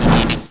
hit

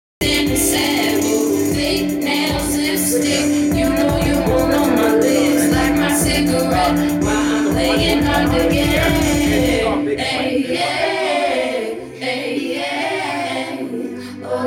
music

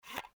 next button

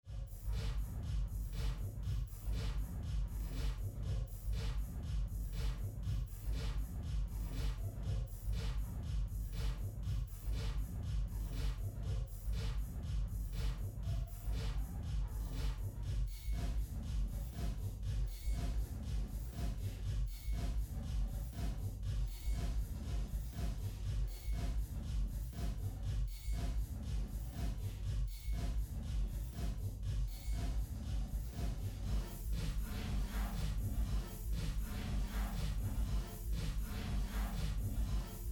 ambient party doorway

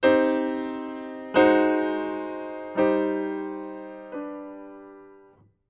Min slow

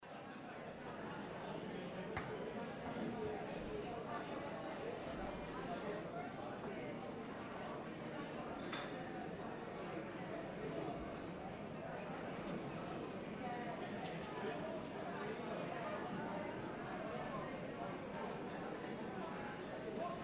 ambient lunch in line

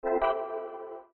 trigger button

